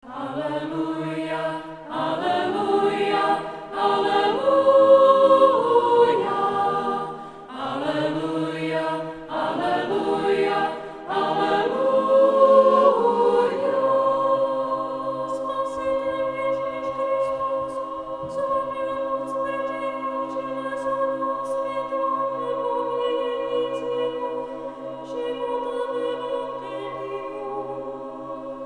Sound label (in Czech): aleluja